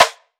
Rimshot Snare; Drum One Shots; Snares; Cymatics; Cobra Hip Hop Sample Pack

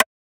Click Perc, Percussion; trap; Cymatics; Trap Starter Pack

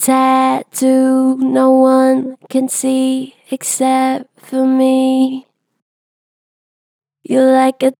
Gen Flat, Vocals, Pitch Flat Gentle; Harmor Vocals

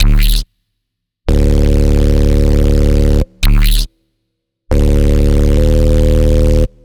Bass Loop Dm 140BPM; Dubstep; Antiddote; Ghosthack - Advent Calendar 2022 - Day 18 - Bass Loops

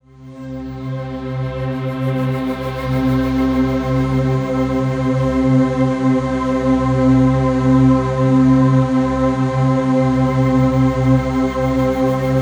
Atmosphere

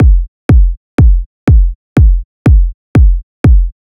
Kick Groove, 122bpm A#min; Construction Kit; Ghosthack; Summer Freebie; Acapella Kit

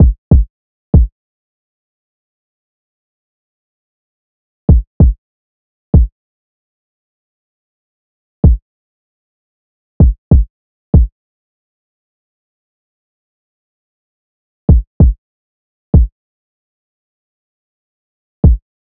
Drum Loop 96 BPM Kick; Loop Stems; Cymatics; Vibes II Drum Loop Collection; Forgotten Drum Loop

